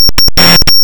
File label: Blink